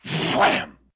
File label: Vocal wham